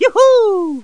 Juhu; wolfpac

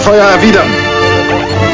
feuer erwiedern

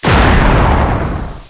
Kanone; PC Gamer IT CD 48; 1602 AD; 1602ad demo

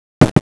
klopf; digitus

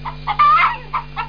Glucke

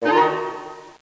Maulwurf; Loewe1

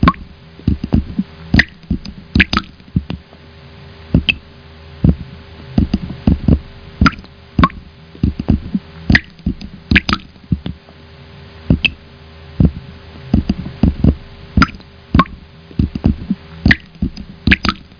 tropfen, wasser; german; Pinnacle

